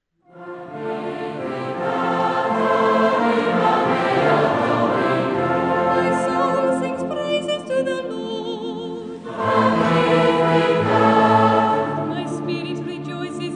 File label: Magnificat choral